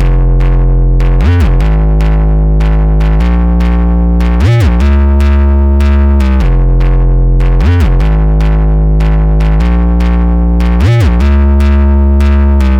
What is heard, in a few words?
Bass Loop F 150; embers; Osteroth